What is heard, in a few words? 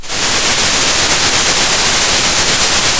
Sound of a thunderstorm